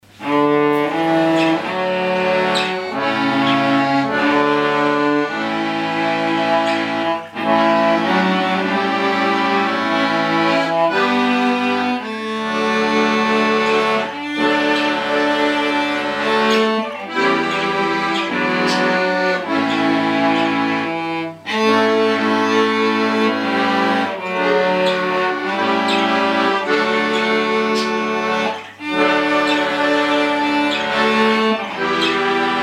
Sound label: CELLO AKORDEON; violoncello + akordeon